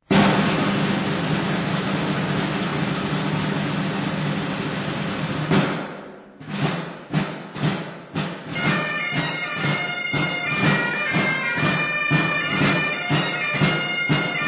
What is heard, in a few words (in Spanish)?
Gaitas y tambores